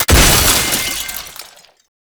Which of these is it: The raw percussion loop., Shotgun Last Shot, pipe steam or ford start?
Shotgun Last Shot